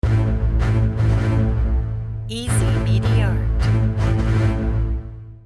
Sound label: dirty cello